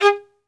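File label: SOLO VIOLIN, Synclavier Strings; ILIO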